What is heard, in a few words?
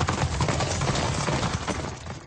horse ride; sfx